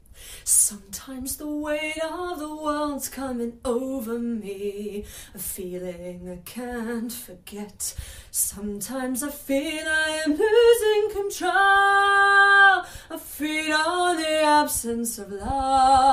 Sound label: Glottal Diphthong